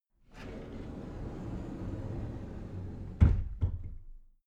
cupboard slide door close soft

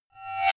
metallic reverse hit

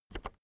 keyboard external enter press fast soft

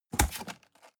cardboard box close fold scrape short

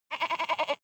goat baa stutter male

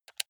console controller playstation press

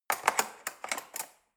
cutlery fork drawer handle scrape

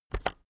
keyboard external enter press fast

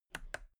toolbox latches open regular double

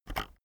keyboard external enter press very fast soft

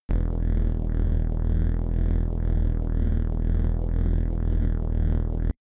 space inside biomedical alien shuttle low pumping

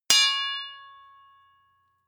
sword impact metal ring heavy

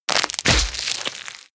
tear out bones fleshy

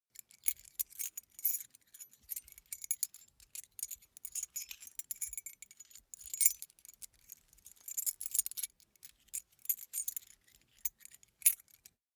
key ring small rattle sequence

